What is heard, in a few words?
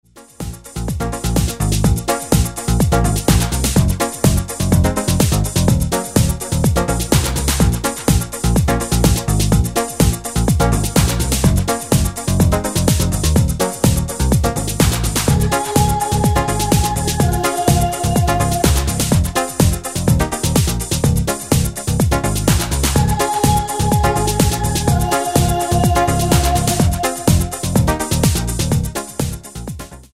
dub, song